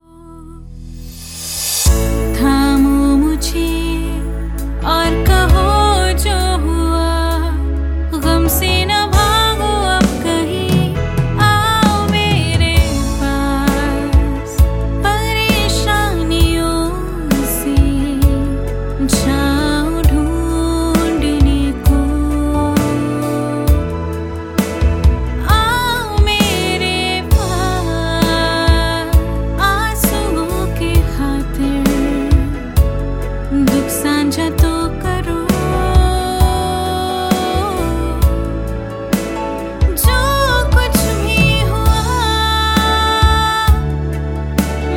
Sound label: Aao mere paas hindi